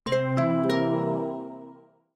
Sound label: you lose; Pirates; treasure quest